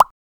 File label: a soft cute bubble pop